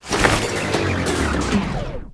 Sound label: Bag full Conveyor stop